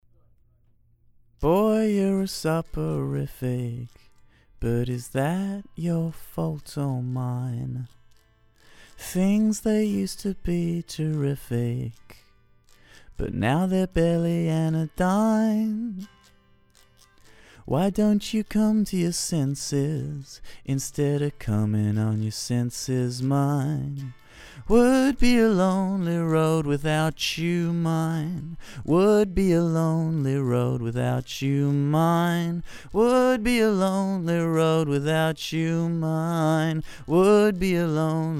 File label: Vocal